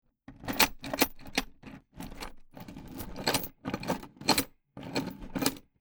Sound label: Chain Metal Rummaging; Chain Sounds; Metal Sound Effects